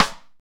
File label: rich low end steel snare drum sample D key